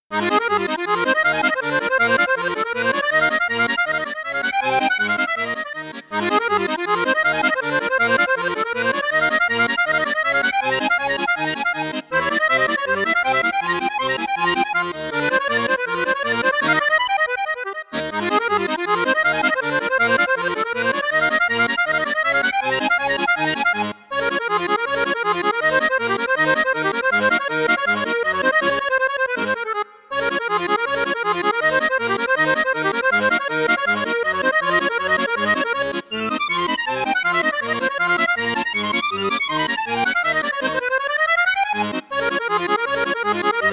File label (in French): registre flute juste flute haute, accordeon; paolo soprani